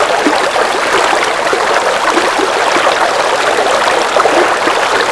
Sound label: brook; WhiteOut; XBOX; bokujo